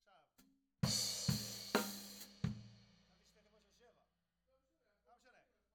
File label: Tom